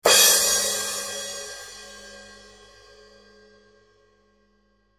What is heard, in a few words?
crash